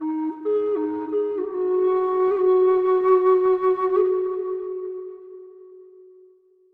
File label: flute, Jazzmatazz loops